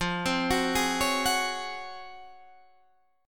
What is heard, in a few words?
Db Major 11th F